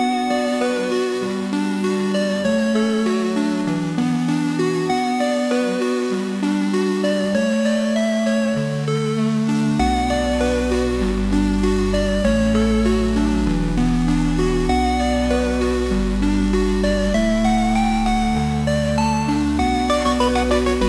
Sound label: tune, chiptune